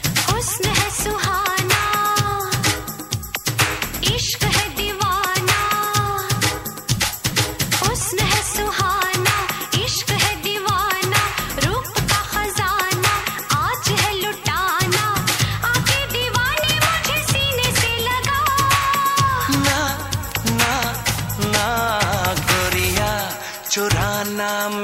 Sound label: Song Ringtone